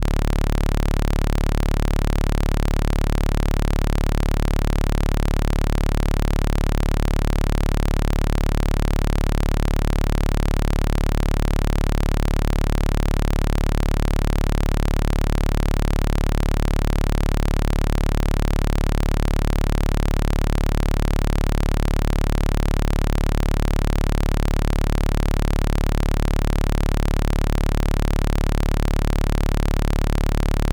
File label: Mixup output SAW; modular; ac-coupling